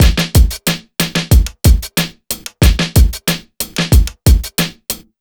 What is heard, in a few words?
92 BEAT, Pure Electro, BEAT MIX